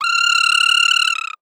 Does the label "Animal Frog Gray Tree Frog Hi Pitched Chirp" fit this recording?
Yes